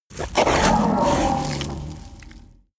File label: kraken growl hiss; kreaturen